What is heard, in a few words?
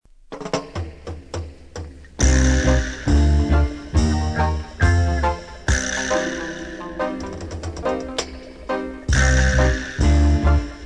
african style; african style version